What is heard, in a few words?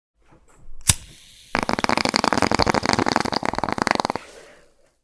bong